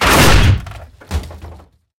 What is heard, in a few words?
tank impact generic